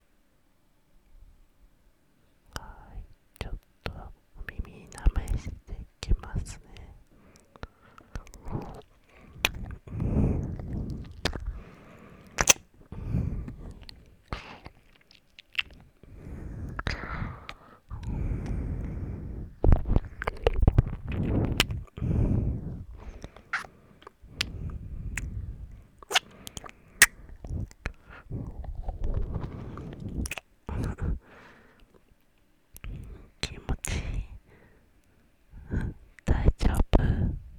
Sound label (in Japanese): 耳かき舐め, ASMR